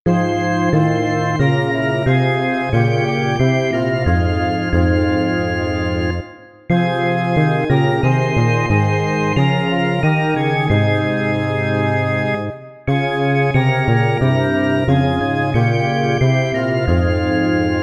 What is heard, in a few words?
bach chorale